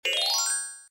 Upgrade; Angry Gingerbread Run

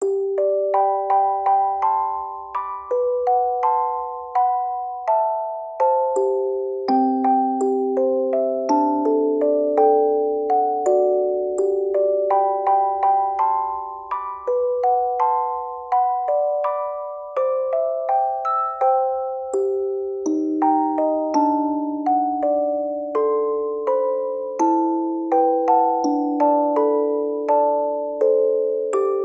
Serenade chime; shukufuku no kane no ne wa sakurairo no kaze to tomo ni original soundtrack

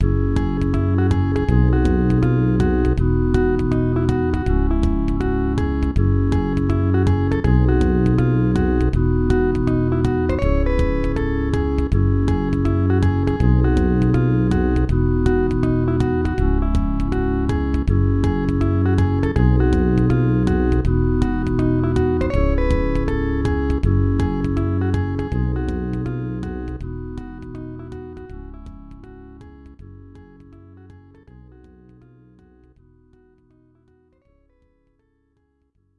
Error Screen; Satellaview BS-X; SNES

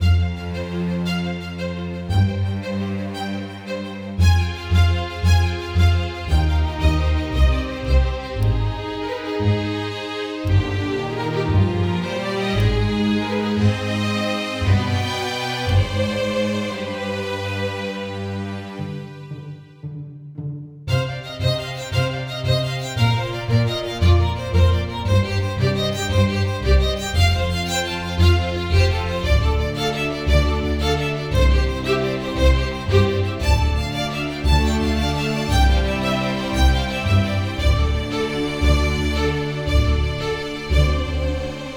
Music Genius Bowed; 7 Mages

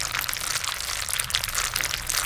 Flesh Loop

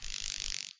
Amb Bug

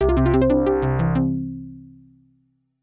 Wave Complete; Off the Wall

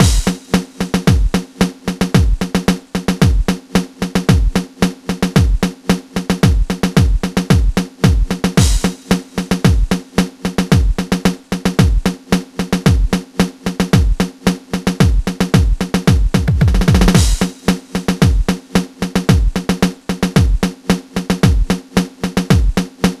Bongo Monkey; Pinky and the Brain The Master Plan; GBA

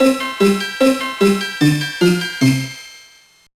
All Candies Collected; The Polar Express; GBA

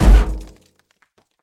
Capsulte Impact heavy

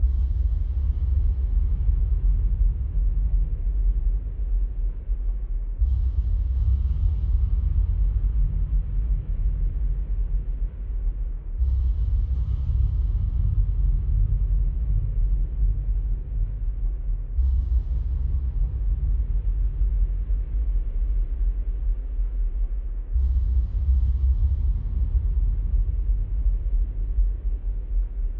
Low Dark Very Subtle; Cat Interstellar

